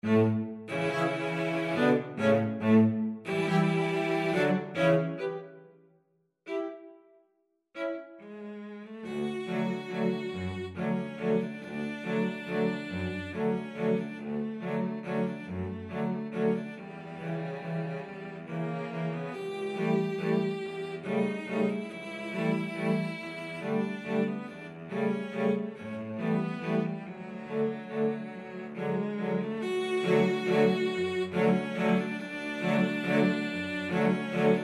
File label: waltz, cello quartet